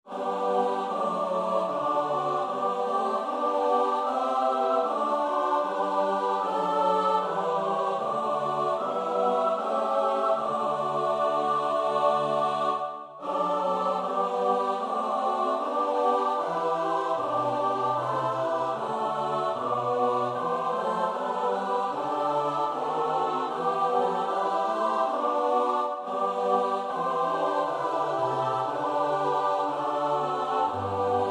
S-A-T-B, koorpartij; Bach (Johann Sebastiaan); 5 Heut’ schleußt er wieder auf die Tür

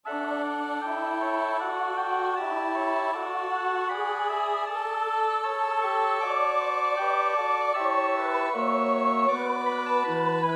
tenor; Reger (Max); Auferstanden, auferstanden; Jesus, Jesus, diese Freude, dich zu schauen wünsch ich mir

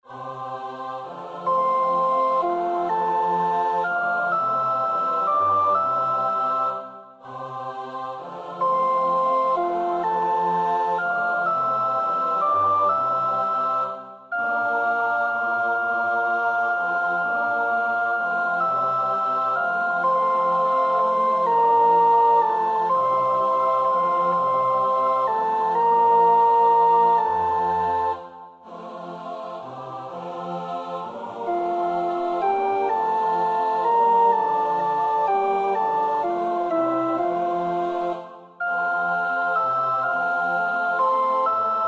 sopraan 1; Handl (Jacob Gallus)

